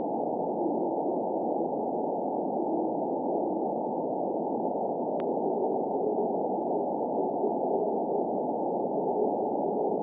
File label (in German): CW schwach aus Japan